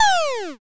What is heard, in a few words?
biyoon